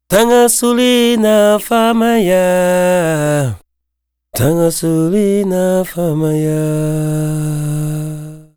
African Lead Vocal; Continental Drift Aftershocks; Vocals

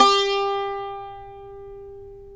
SAZ; Spectrasonics; Hans Zimmer Guitars VOL-2

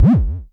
TOM, Klone Dual-Percussion-Synthesiser; KLONE FX NW8

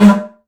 Didgeridoo Kit Samples

